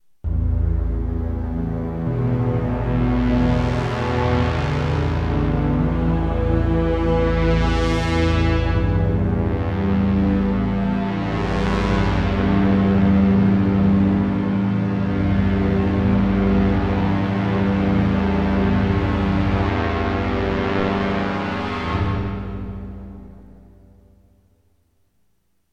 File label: pad